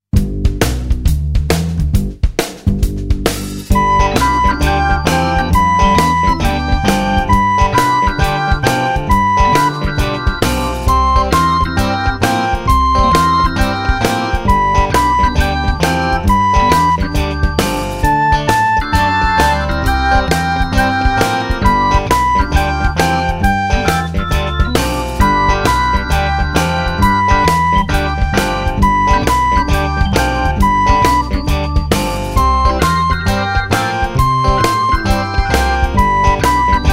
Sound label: Step by step Suffle